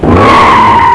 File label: SKID